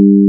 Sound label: long kettle